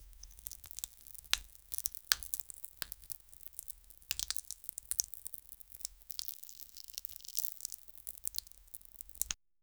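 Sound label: wig hair tumbles or falls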